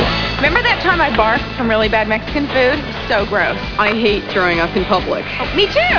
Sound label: barf